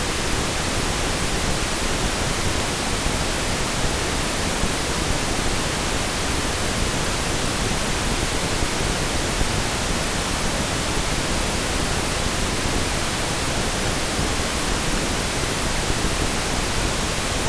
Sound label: Pink Noise